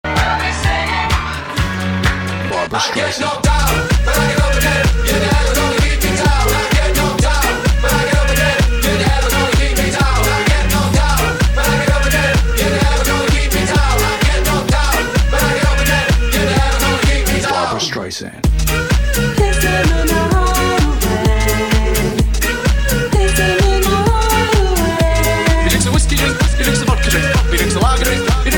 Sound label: Classic Club Party Mash Up